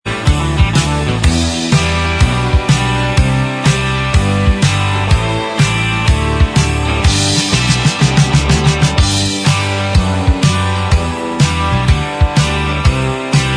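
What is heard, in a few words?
instrumental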